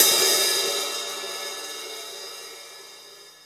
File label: open, Drums Percussion; Zero-G; Total Drum Bass